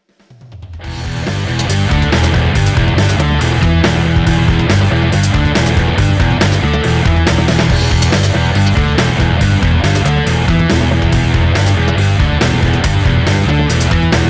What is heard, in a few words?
140bpm remix